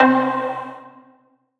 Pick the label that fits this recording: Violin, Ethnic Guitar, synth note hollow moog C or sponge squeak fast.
synth note hollow moog C